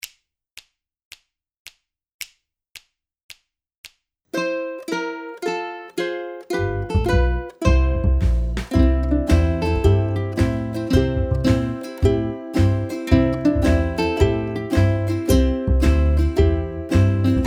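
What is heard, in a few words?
FRANKIE AND JOHNNY BACKING, backing tracks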